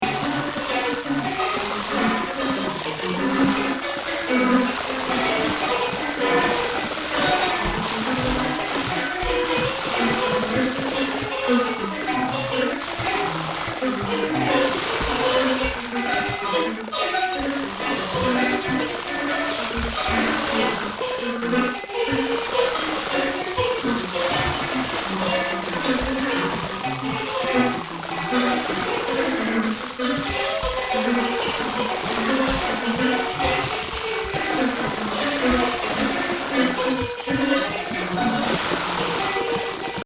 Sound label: crunchy